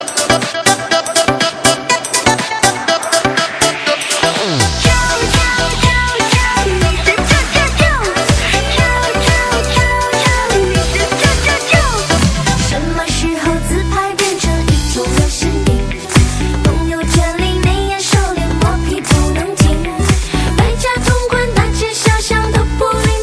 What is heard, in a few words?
old chinese ringtone